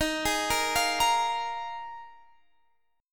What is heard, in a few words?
Eb Suspended 2nd Suspended 4th, Guitar, Standard; Ebsus2sus4 arpeggiated; JGuitar